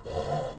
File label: horse hurt; minecraft; technic